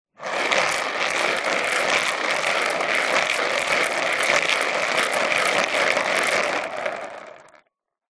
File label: leisure toy plastic bingo balls spin swirl in plastic tub; zapsplat